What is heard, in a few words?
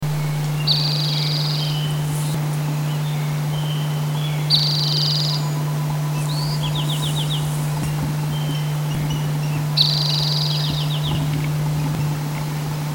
Bewicks Wren